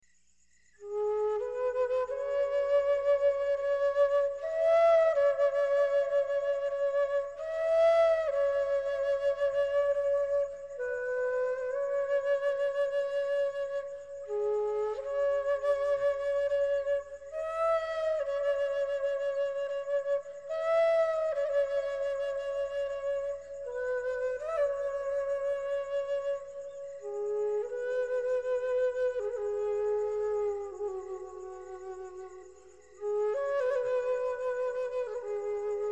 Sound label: night flute